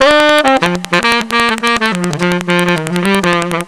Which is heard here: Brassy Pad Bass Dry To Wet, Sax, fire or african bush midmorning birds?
Sax